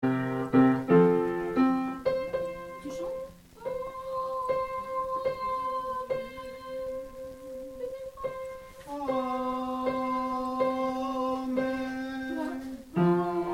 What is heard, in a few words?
Amen long Tenor